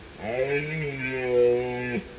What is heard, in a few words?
C elaphus hippelaphus